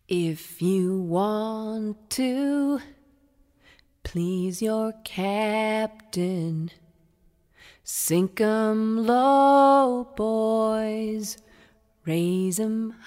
Chain Gang

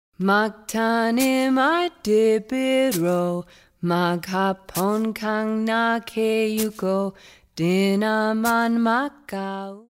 Work Chants Planting Rice

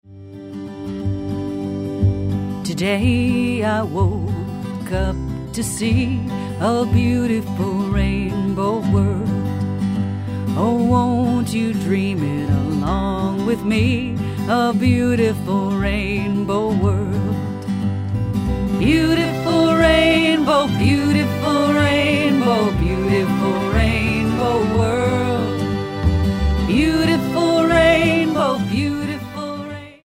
Beautiful Rainbow World Acoustic Version

